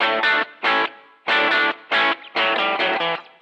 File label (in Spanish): Guitarras